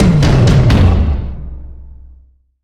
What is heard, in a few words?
Music Stinger Aware; Oddworld: Abe's Oddysee New 'n' Tasty; Slig